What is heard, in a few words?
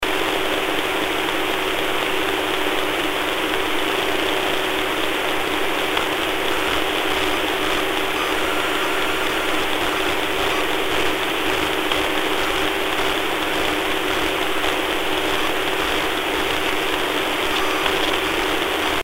noise with hdp plus